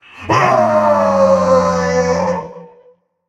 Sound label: fear, voicepack; smite; jorm